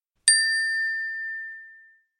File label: Ding